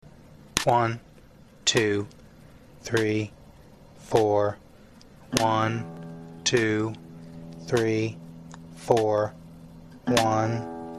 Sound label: example, guitar, Here it is at 50 BPM